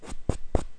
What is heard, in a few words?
chalk cue